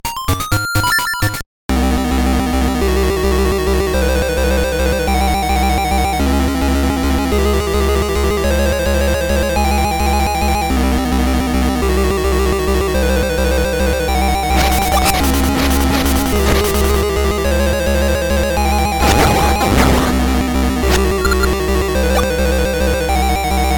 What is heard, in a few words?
battle theme; Four swords gba